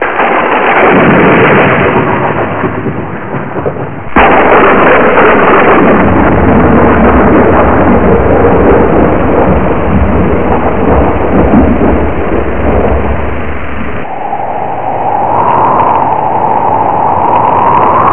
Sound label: storm